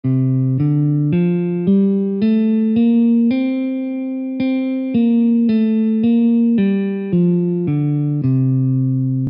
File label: scale; scales of India